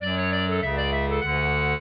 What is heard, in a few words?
trio, clarinet; Mozart